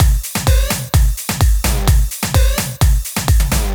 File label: Mainroom Kit